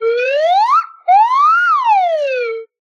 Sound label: slide whistle good lookin